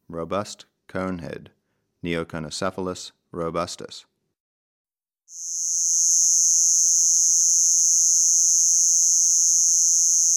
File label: Robust Conehead Katydid, Neoconocephalus robustus; Crickets and Katydids